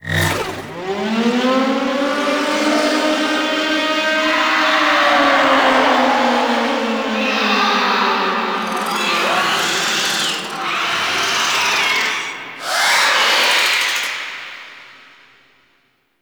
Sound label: Infested Defense Start Waves; Missions; Grineer